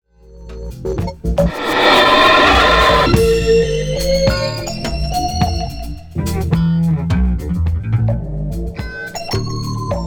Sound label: hiss